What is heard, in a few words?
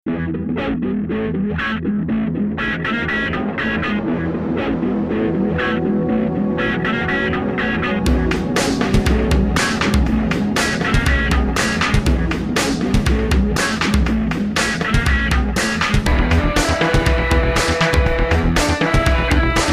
psychedelic